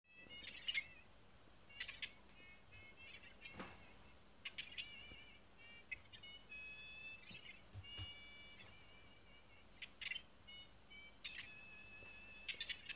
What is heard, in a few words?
mp3 playback errors decoding errors; Riuzu X02